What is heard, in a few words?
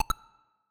key; FireSoldier